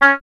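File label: Wind OBOE C; Miroslav Vitous - Solo Instruments VOL-2; Solo lnst. II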